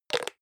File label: hygiene small cup close medium; haus-garten; badezimmer